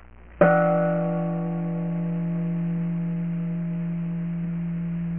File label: gong